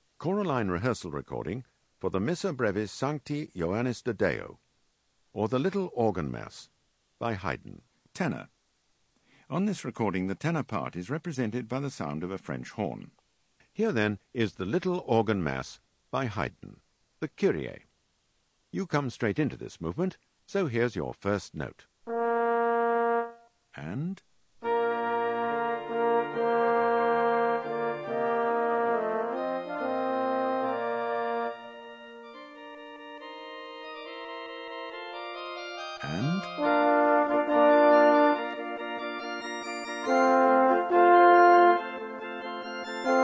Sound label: Tenor; Haydn Little Organ Mass